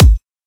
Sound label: soft hit whistle